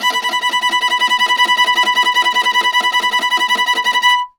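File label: VIOLA tremolo B4; Orchestral; DirectWave; Varazdin Orchestral; Viola II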